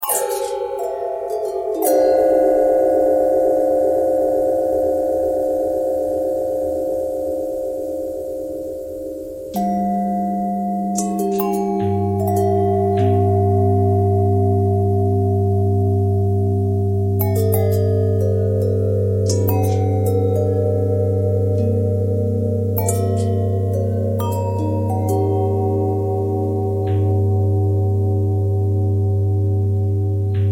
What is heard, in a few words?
bell long bell twist, bells